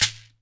SHEKERE, Synclavier Percussion, World & Orchestral; ILIO